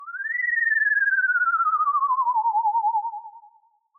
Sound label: tone; Halloween; seasonal